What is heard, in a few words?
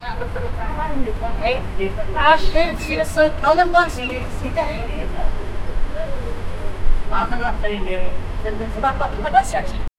an old petrol station, filling